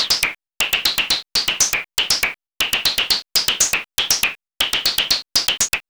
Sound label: MOOG HAT; AMG - Vince Clarke Lucky Bastard